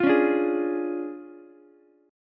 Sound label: D Minor Major 7th Flat 5th D Ukulele; 10,13,10,11; DmM7b5 strummed